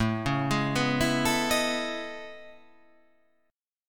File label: A Major Sharp 11th